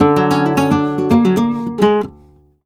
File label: Remates De Pulgar, WAV Guitar, Flamenco